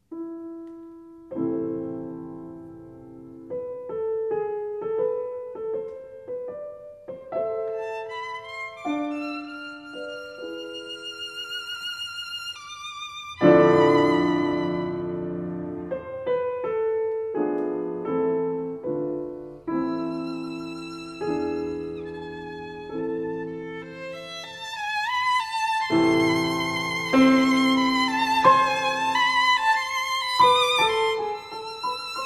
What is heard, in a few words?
Violin Sonata Andante